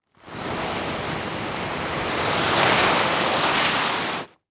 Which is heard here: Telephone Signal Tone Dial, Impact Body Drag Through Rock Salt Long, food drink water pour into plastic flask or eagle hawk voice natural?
Impact Body Drag Through Rock Salt Long